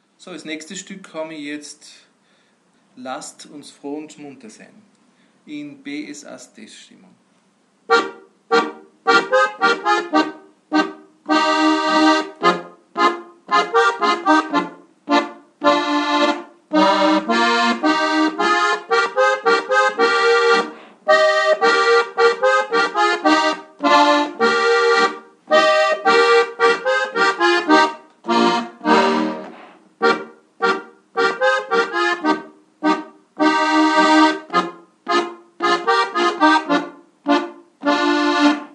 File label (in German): Es Dur, Steirische; Lasst uns froh und munter sein